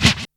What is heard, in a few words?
DR-660 Perc, Drums, Boss DR-660